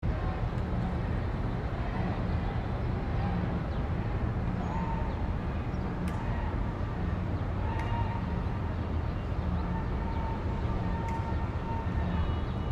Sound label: la downtown pershing square noon sirens helicopter protests birds cars busy streets